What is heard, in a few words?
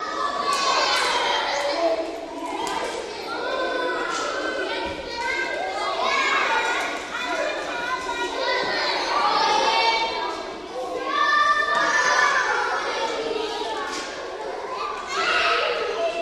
Children Loop Kinder, kids play